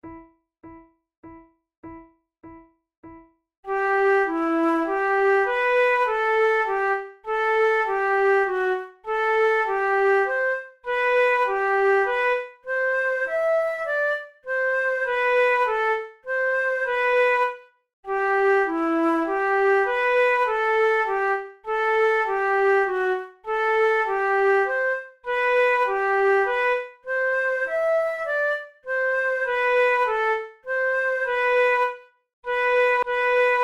duos grazioso, flute

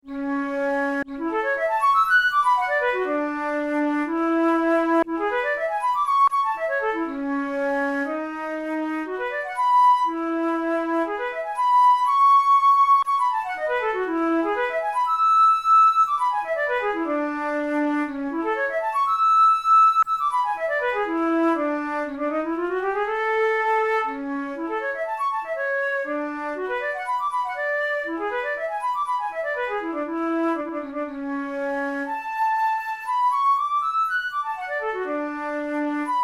studies for the boehm flute; drouet 72

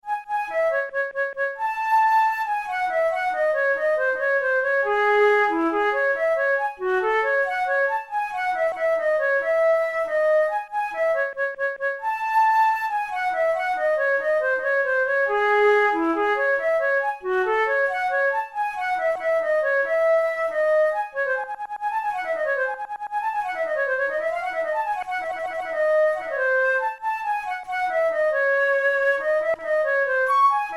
flute studies in c sharp minor; dothel

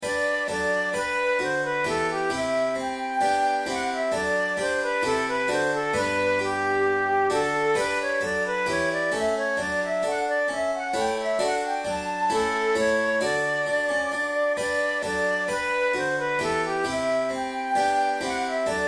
flute sonata in g major bourree; handel

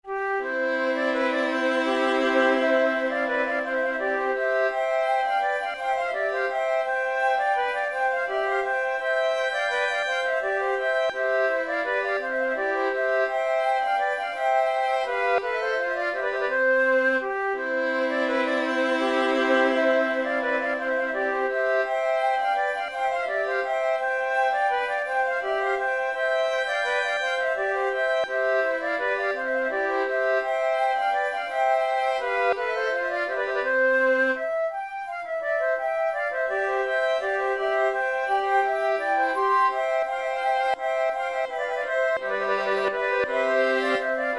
musette, flute tunes; naudot; les plaisirs de champigny iii

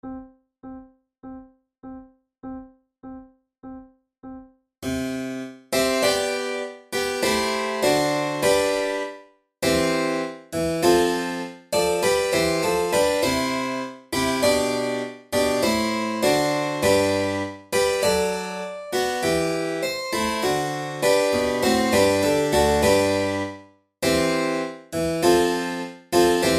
violin sonata allegro; corelli

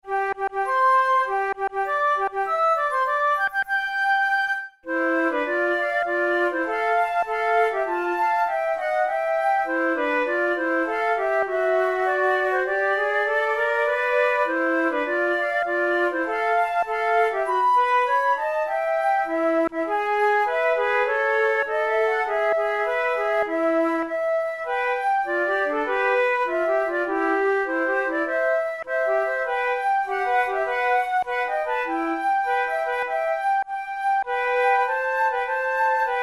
duet in c major, flute; kohler op93 no2 duet no2

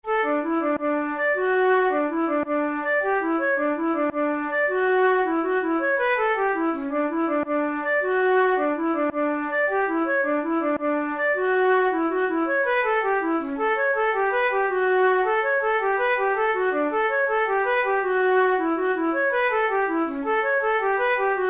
slip jig

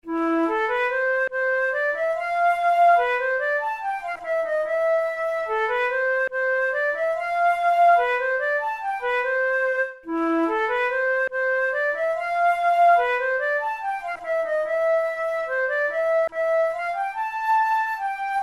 valse in a minor, flute tunes; chopin

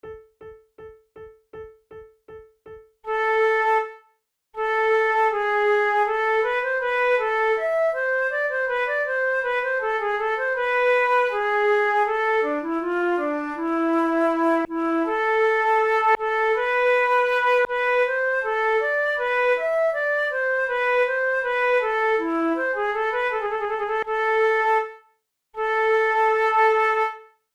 prelude, flute; boismortier op 22 no44

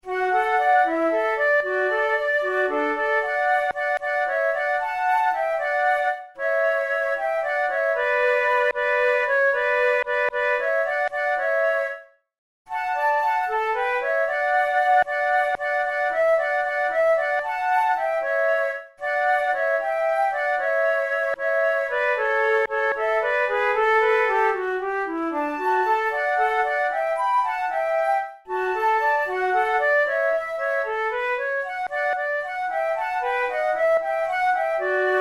duet, flute

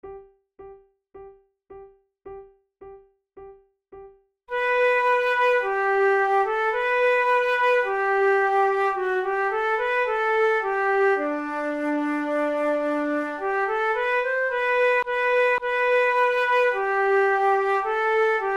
march duet, flute

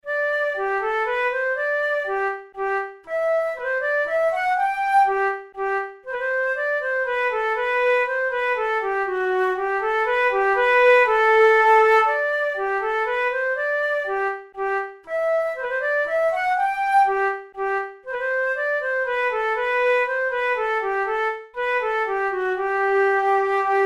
minuet in g major, flute; Petzold

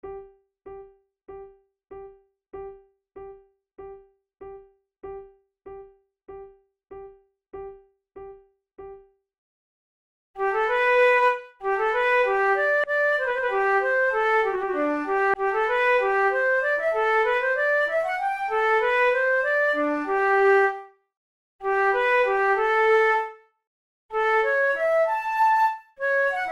for two flutes allemande; Monteclair; 6 concerts; flute tunes

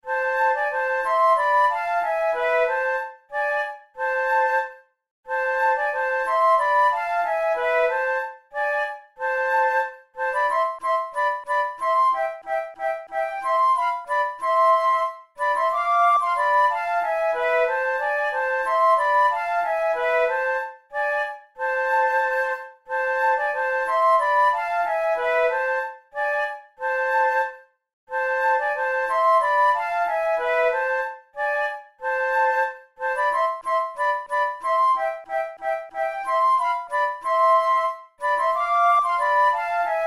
duet, flute tunes

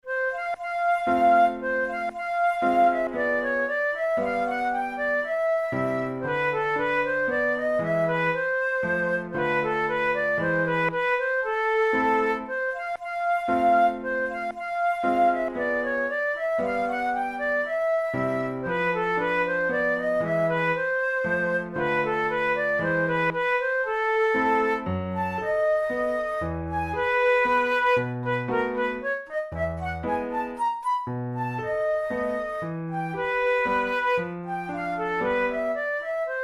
mazurka in a minor, flute

